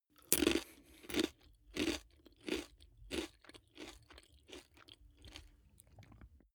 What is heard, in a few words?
eat potato chip mouth closed normal